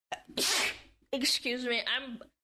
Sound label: sneeze